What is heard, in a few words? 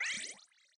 heal